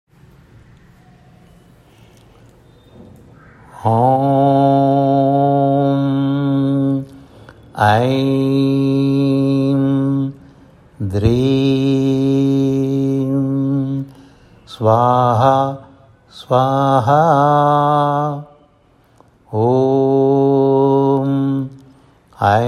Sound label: om aim drim swaha swaha, mantrams; Mantrams - Working with Sound